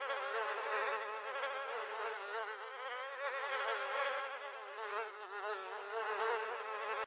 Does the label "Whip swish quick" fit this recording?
No